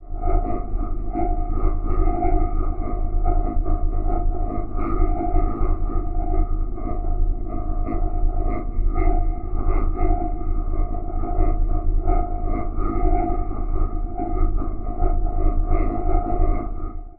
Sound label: forcefield